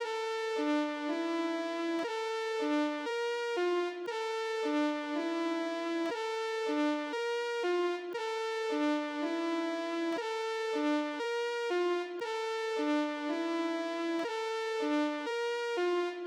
118 BPM D Min Saw, loop stems; Melody; Cymatics; 2022 Melody Collection Platinum Expansion; Off White

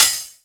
Open Hi Hat, Dubstep Cymbals; One-Shots; Drums; Ghosthack; Ghosthack - AC2024 - Day 13 - Dubstep Sounds 2025

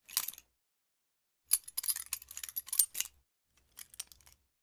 Butterfly Knife Open; Fairlight Sound Lib

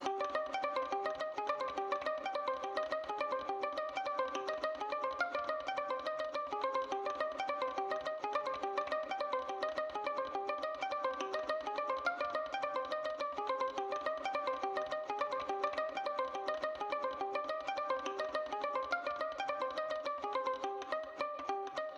140 BPM C Min Pizzicato Violins; Trap; Melody Loops; Loop Stems; Cymatics

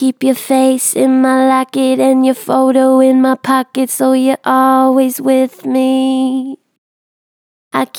Natural Vibrato Gentle, Harmor Vocals

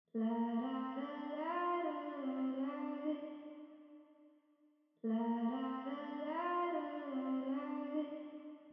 98 BPM C Min Vocals; Loop Stems; Cymatics; Cymatics Gems Vol 10 - Winter

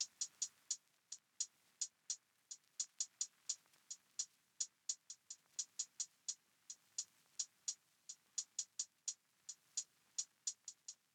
Hihat Loop, 86 BPM, Lofi; Hihat Loops; Clear Out; Cymatics; GHOST (Beta Pack)

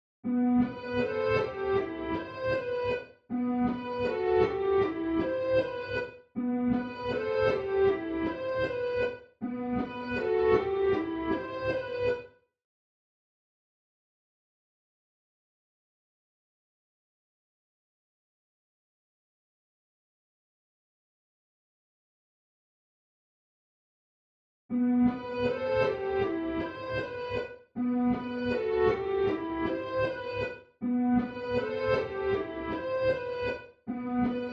157 BPM E Min Reverse Piano; Melody; Loop Stems; Cymatics; 2022 Melody Collection - Reverse; Purple and Gold

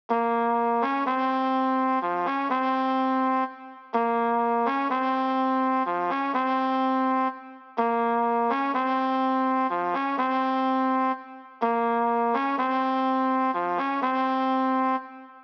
125 BPM F Min Trumpets, loop stems; Melody; Trap; Cymatics; 2022 Melody Collection - Trap Pt 2; Suicide Doors

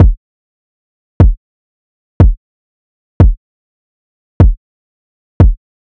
Drum Loop 150 BPM Kick, Trap; Alterations; Cymatics; ANALOG EVOLUTION Beta

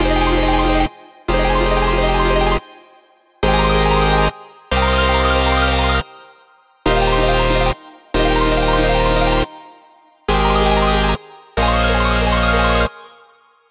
Future Bass Drop Loop, 140 BPM F Min; Synths

